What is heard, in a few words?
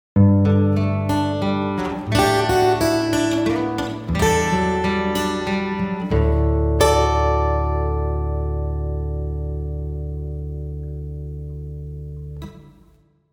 audio logo